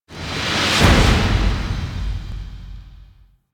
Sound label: Cinematic Battle Start; Warframe; Ambience; Hub; Tenno Junction Relay